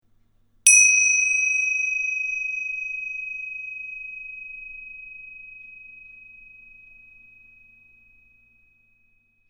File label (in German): zimbel